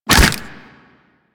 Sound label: Desert Skate Attack Fire B; Warframe; Attacks